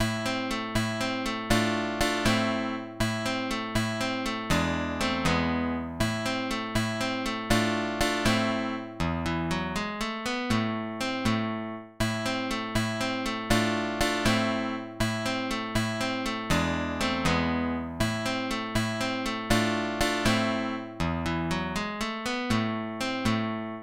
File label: Recreation in A minor